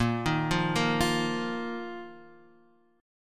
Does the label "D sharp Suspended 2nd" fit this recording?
Yes